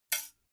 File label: dough hook drop